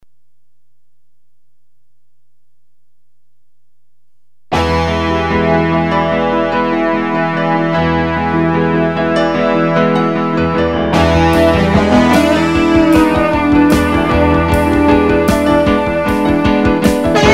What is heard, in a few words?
DGX with Drums